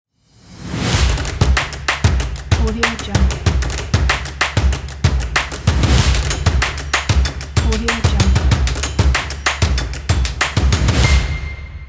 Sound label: playful claps and stomps logo